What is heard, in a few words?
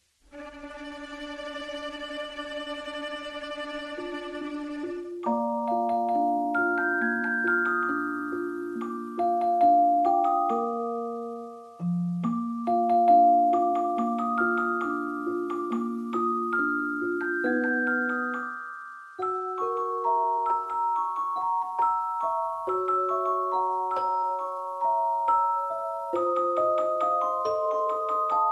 melody w phone ring transition; matilda